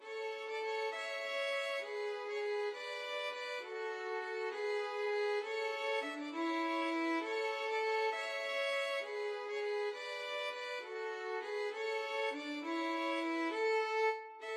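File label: mozart sonata, violins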